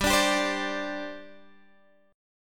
G Suspended 4th G down Guitar Half Step Down

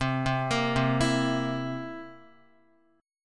C 7th C down Guitar 4F, 4A, 5C, 5G, 5C, 6E; arpeggiated; JGuitar

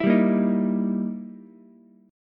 E Minor Major 7th Flat 5th, E, down, Ukulele; strummed; JGuitar

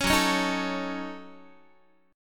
Gb Minor 6th Gb down Guitar; Gbm6; strummed

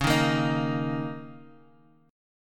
Bb Minor Double Flat 5th Db

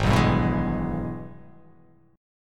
G sharp Minor Major 7th Sharp 5th G sharp down

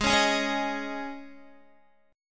G sharp Suspended 4th Guitar chord, down; strummed

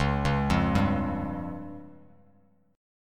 C sharp Major Flat 5th, Bass Standard; C#Mb5 arpeggiated; JGuitar

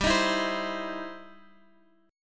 E Minor Major 7th Sharp 5th G

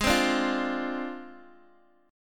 Ab 6th Flat 5th, down, Guitar, Open G

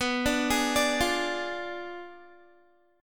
B Diminished 7th B Guitar; arpeggiated; 4F,4A,5C,5G,5C,6E

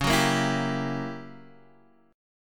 Gb 7th Flat 9th Db

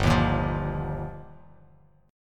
G Minor 7th Sharp 5th, Bass Standard; Gm7#5; strummed; JGuitar; 3,6,8,10

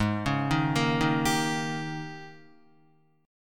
Ab Major 7th Suspended 2nd Suspended 4th, Guitar; arpeggiated; guitar chord; 3,4,3,3,3,3; JGuitar

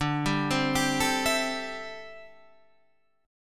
D 9th Suspended 4th D down Guitar Standard; arpeggiated; JGuitar

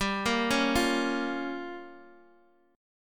G Minor 7th Flat 5th, Guitar DADGAD

